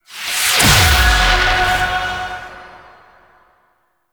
Choir Chorus Cast Power

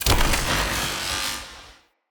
Cannon Tesla Equip Charge; Weapons; Player

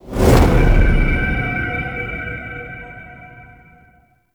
Whoosh; Cinematics; Drifter Lotus End

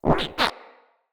Mine Osprey Idle; Characters; Corpus

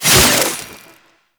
Kuva Lich Finisher Parazon Stab Out; Warframe

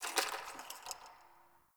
Ice Crack One Off

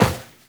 Run Metal, Footsteps; Warframe; Tenno

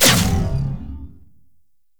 Vaults Electricity Hazard Pulse; Gameplay; Entrati

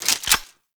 Sniper Skin Reload Start; Warframe; Weapons; Venkra Sniper Skin

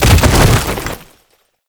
Bodyfall; Warframe; Lasrian Soldiers; Foley

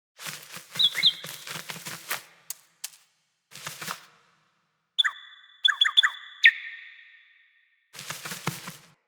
Cardinal Feeding Male; Thawtide

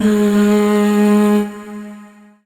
Void Shawzin Oct One G Sharp; Warframe; Zariman

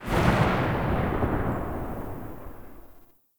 Archgun Tail; Warframe; Weapons; Archwing; Tn Concrete Archgun

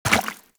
Land Goo, Footsteps; Warframe; Tenno

